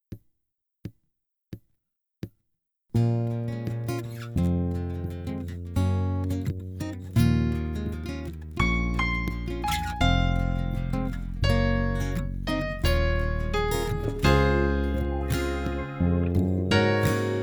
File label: No Sax Horns Included Key Of B Flat Minor